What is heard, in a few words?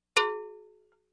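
cow bell single; Колокольчик на шее у коровы — одиночный удар; Kolokolchik u korovy odinochny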